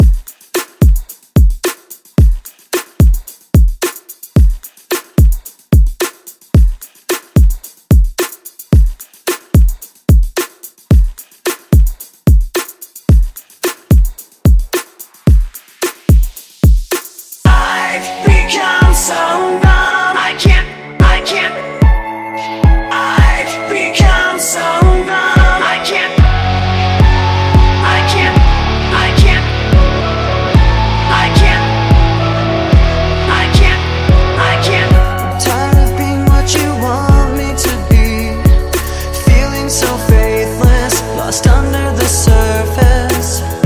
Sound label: Pop Rock